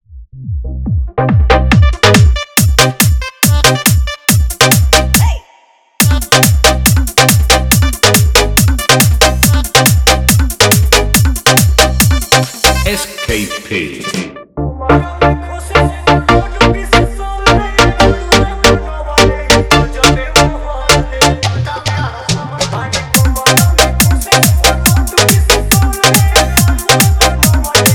Bhojpuri Dj Remix Song Hard Electro

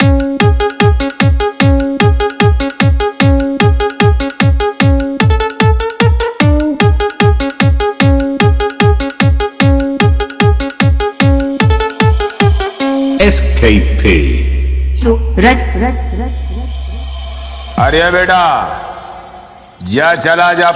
Comptiton Mixx; Demo Version

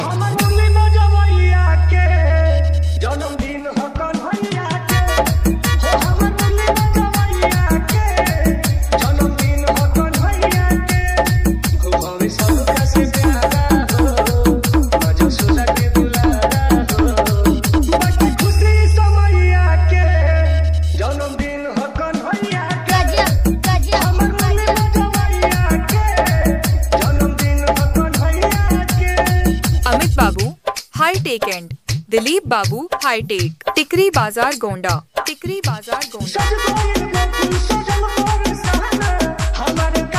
Janmashtami Dj Song Hard Bass Mix

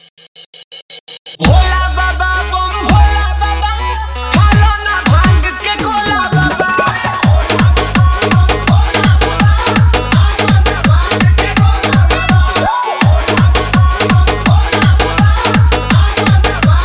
Bol Bam Dj Remix Song Hard Electro Bass Mixx; prayagraj

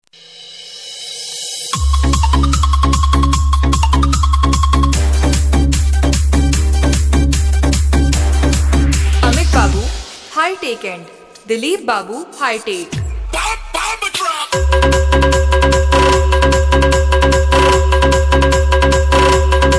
Dj Song EDM Drop Trance Mix

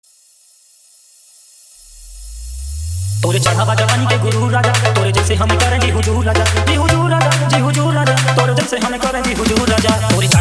New Trending Bhojpuri Dj Remix Song; Hard Faadu Electro Bass Mixx; dj sunil snk prayagraj

